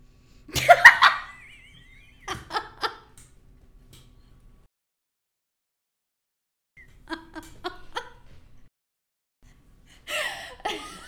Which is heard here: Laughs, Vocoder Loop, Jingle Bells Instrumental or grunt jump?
Laughs